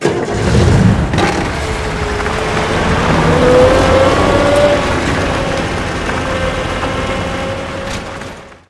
Truck

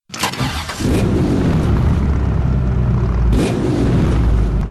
startup

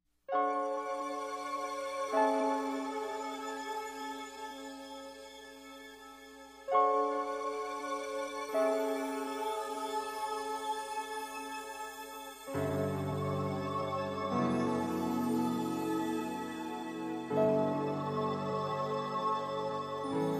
432 Hz; 12 Octaves

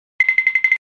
TIP TOE CARTOON XYLOPHONE

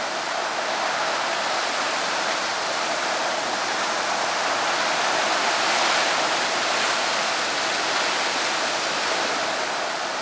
wind weak

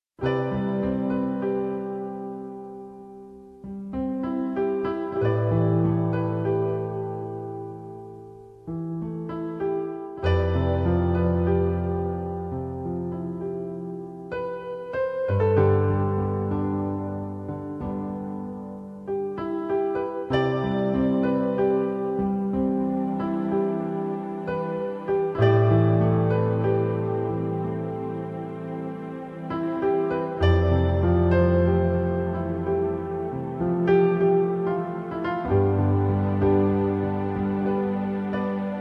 A Rainy Night Piano

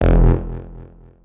error; AC animus

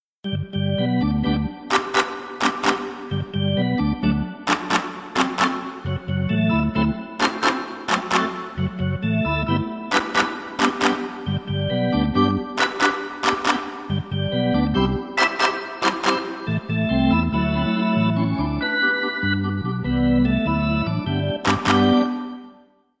Arena Organ

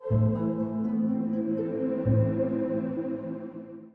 windows shutdown

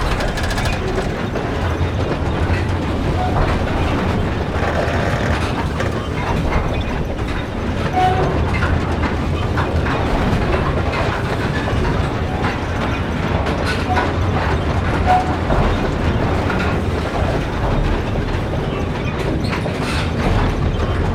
train move

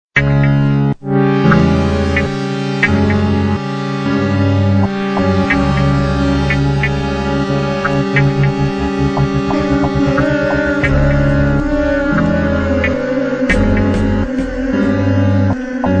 instrumental

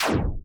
critical stop

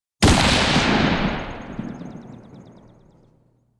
Rifle Shot with Echo

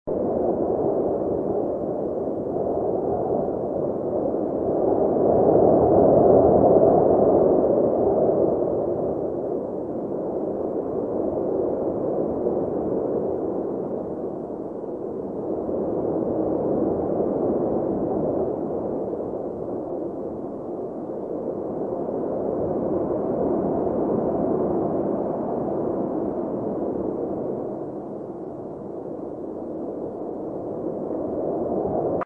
Amb Bunker Int

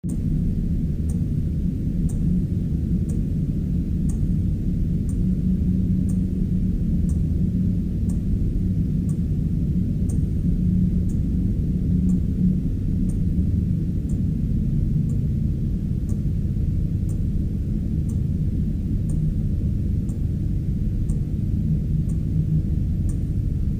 Amb Church Int

